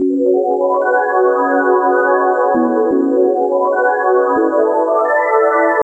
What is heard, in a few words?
pads B; Zero-G; Total Drum Bass